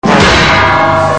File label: fx anvil; Animaniacs